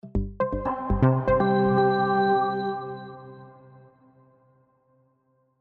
Synth Accent Reverb Vox; Synths; Musical; Blastwave; Sound Effects:Musical

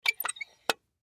Door Lock Unlatch Metal Squeak; Locks; Household; Blastwave

